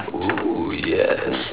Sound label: ooohyes